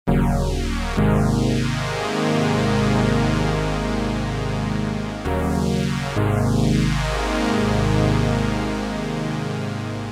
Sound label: notch soft sweep; opxpro